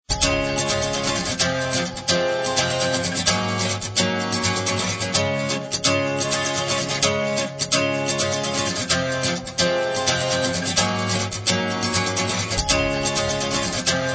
Guitarist Ringtone

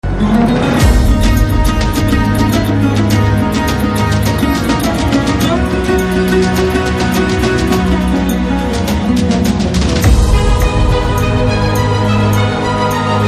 BG Tone Telugu Ringtone

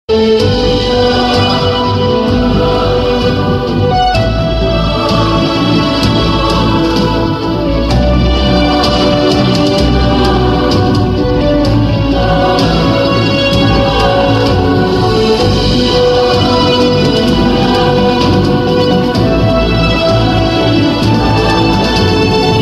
Halloween Ringtone; Pubg

